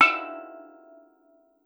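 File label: STEEL DR, Steel Drum Lead Pan, Pitched Percussion; E-MU Producer Series Vol. 7 – Old World Instruments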